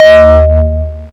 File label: BELL BASS, Hard & Loud Techno; USB Soundscan vol.01; AKAI